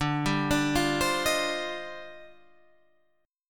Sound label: Eb Major 13th D, guitar